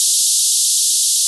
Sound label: psk 2